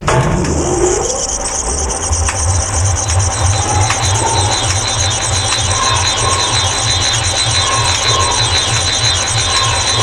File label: ambience prime laser